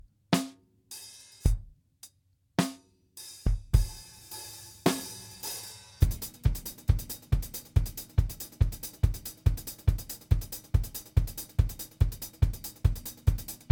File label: Drum Test